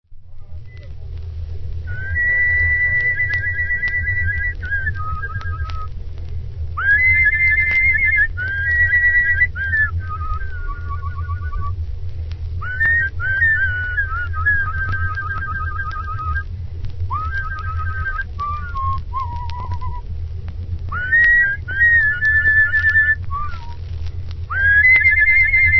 Prison & Field Recordings; DUCKS HOUSE

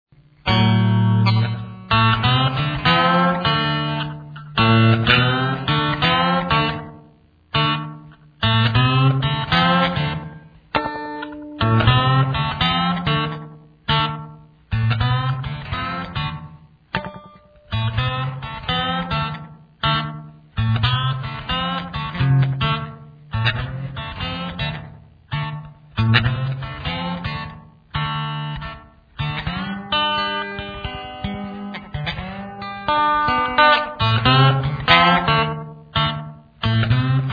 Lap Steel (open D); D.F. Duck

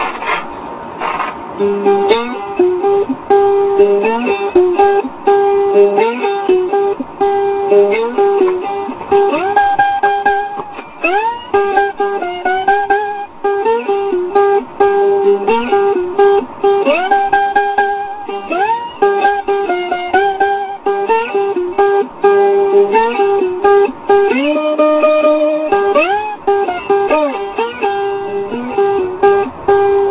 Lap Uke; D.F. Duck; duckulele

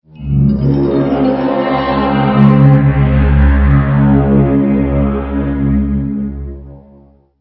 Stinger; STINGERS

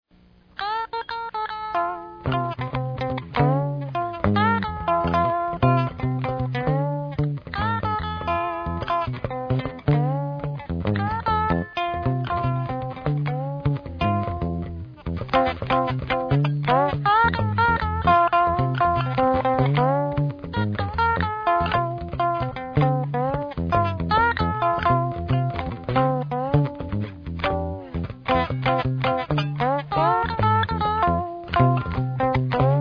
Lap Steel; D.F. Duck

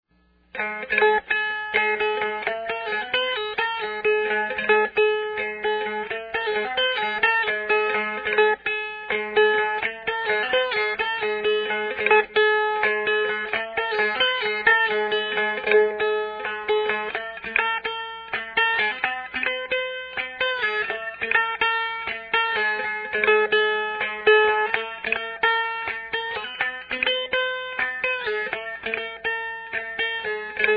Ukulele (electric); D.F. Duck

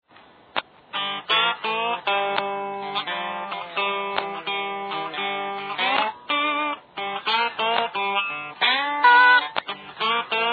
Lap Steel scratched; D.F. Duck

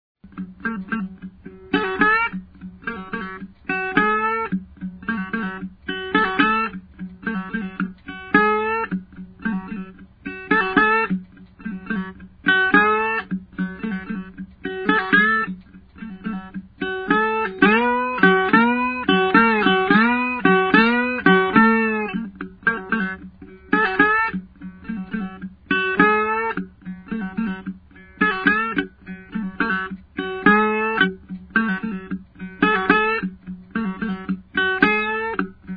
Duckulele Reso; D.F. Duck; Chicken Plicker

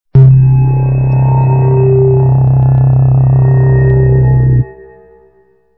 Stinger; STINGERS

